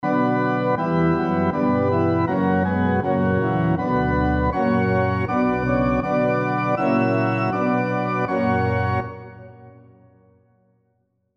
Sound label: SATB on Piano Example